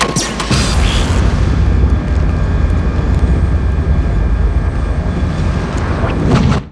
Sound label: goauld door; valve